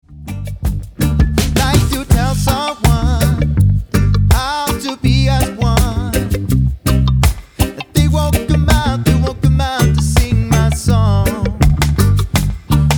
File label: Mr Reggae